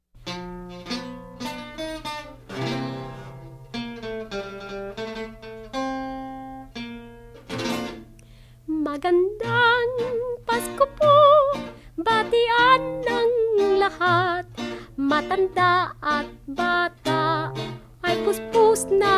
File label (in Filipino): Filipino Christmas Song